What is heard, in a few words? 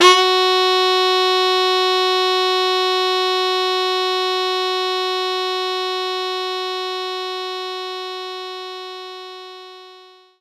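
Baritone Sax A#3; knights try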